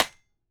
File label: wood shot